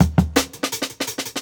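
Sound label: Jungle Breaks, 168 bpm; Original Jungle Breaks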